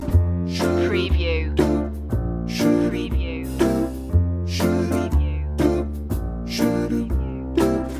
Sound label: fresh and fun loop